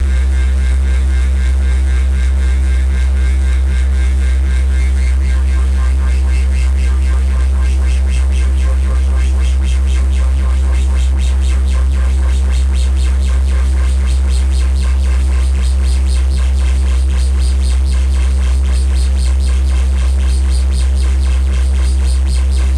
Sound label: Venus Modular, Basses; AMG - Prototype Drum & Bass